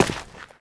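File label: player dirt, footsteps; csgom; cstrike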